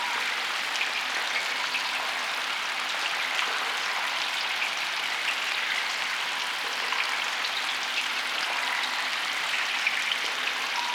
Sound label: Water Trickle Amb Loop